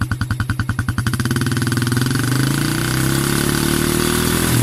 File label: supercub110 muffler